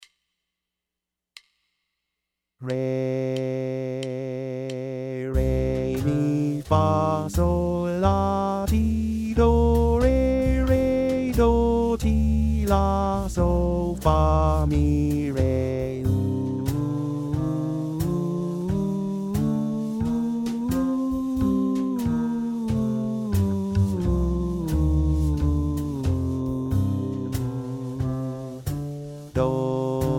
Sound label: C Dorian 90 Bb